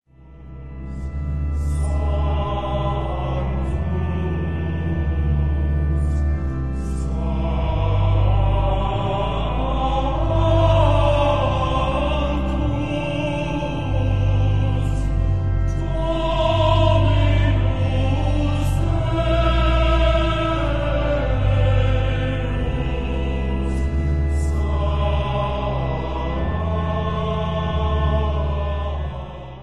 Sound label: Missa for baritone baritone chorus orchestra organ; Durufle; Cum Jubilo; Op. 11